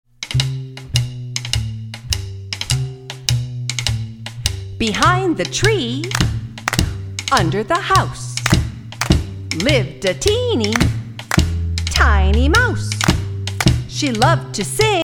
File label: the clapping mouse, Clap to the beat!